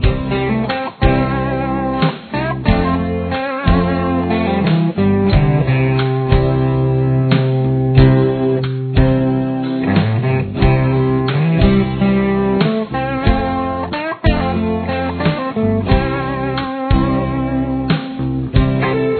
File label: c solo